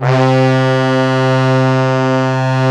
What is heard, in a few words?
MOOG; USB Soundscan vol.49; Analog Fantasies; AKAI